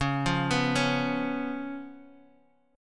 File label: B Suspended 2nd Flat 5th, Db, chord